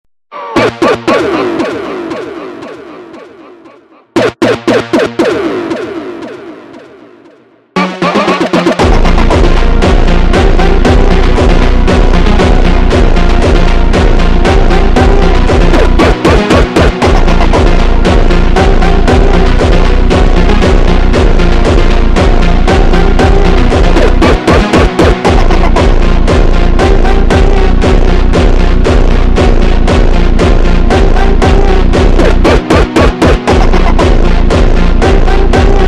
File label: Hardstyl3 slowed